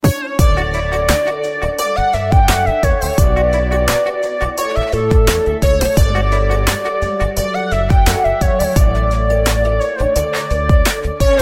Ring Synth Msg Tone

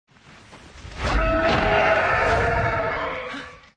roar; toothless

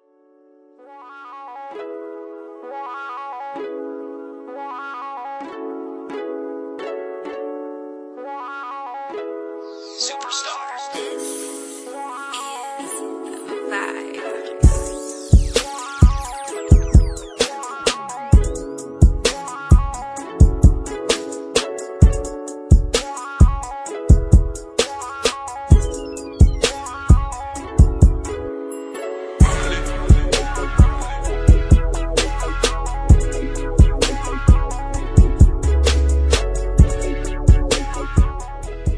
smooth slow beat rap hiphop instrumental

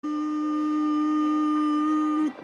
dolphin sound

